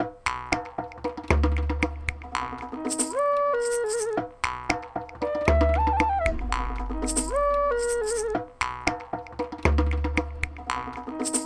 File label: Chill Beat